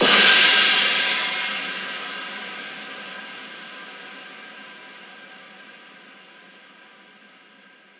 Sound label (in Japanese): 大きな音のシンバルです; ちょっと音量注意かもです！; ジャンル：かんきょう; フリー効果音：シンバル; みかんのおんがくひろば